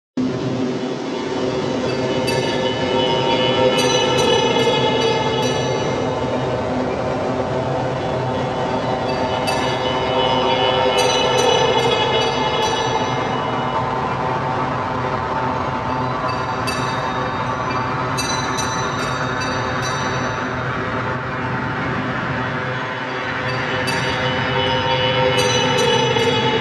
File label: Noise Machine